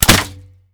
crossbow fire; player

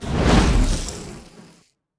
enchantment fire, weapon enchant

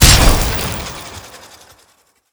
frost spear

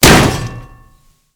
shield heavy block big

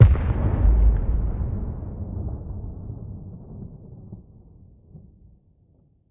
dragon death explosion

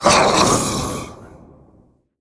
draugr attack

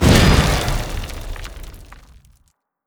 alteration dragonhide fire; skyrim

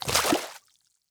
water walk

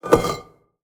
urn large open

